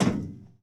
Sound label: catwalk step; Golden Crayon Sounds